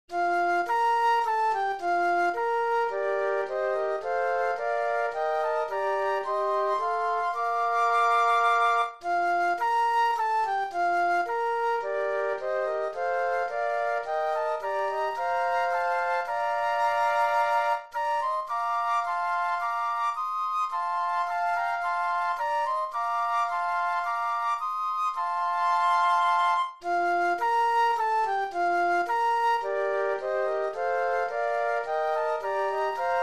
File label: Flute Trio